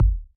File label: Ball Collision Geo Tackleball Extra Light